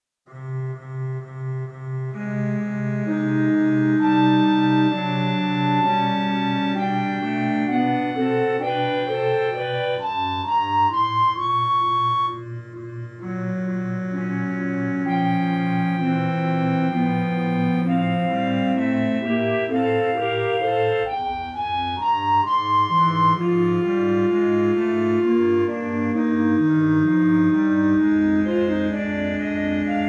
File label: Dissonance Quartet 12tet